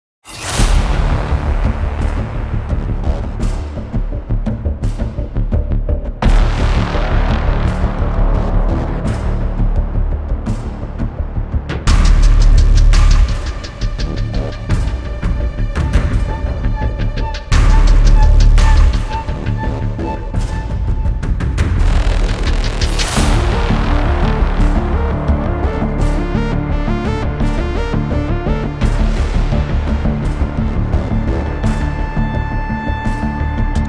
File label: winter battle